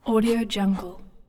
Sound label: male breathing various irregular breathes; human sounds